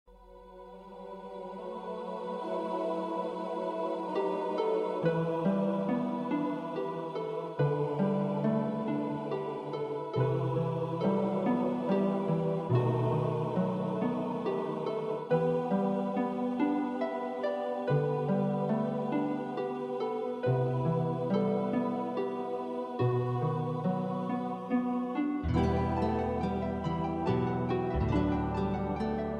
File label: Midi wind